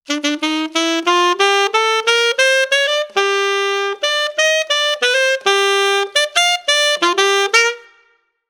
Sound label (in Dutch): blaasinstrumenten saxofoon